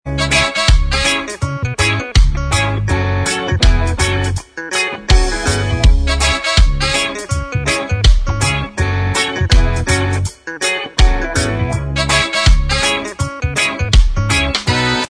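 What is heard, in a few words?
instrumental track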